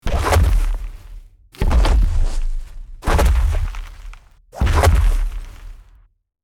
Dragon footsteps heavy walking

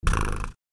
Cat purring

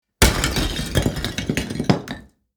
Big blocks structure collapse on bricks and rocks

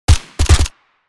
FN SCAR assault rifle suppressed fire

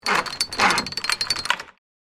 Safe vault dial lock turning click; gears

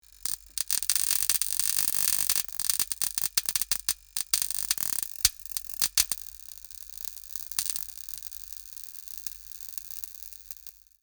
Broken lamp electrical buzz light humming; sound design effects

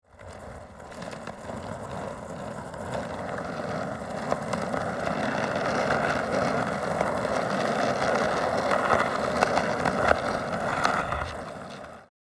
Skateboard loopable rolling on asphalt

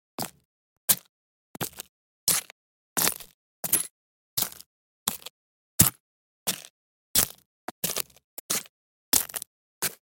High heels walking on broken glass

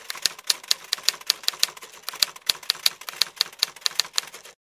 Royal Classic vintage typewriter typing

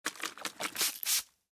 Water bottle shaking and spraying

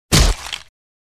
Zombie death

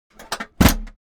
Airplane door closing exterior perspective

